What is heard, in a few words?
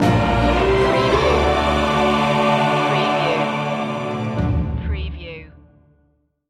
country life stinger